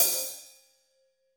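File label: hi-hat, Drums; Bob Clearmountain Drums I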